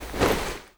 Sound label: foley gear rattle walk